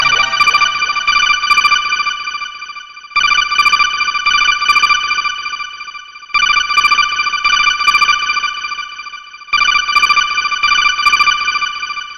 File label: office wake up sms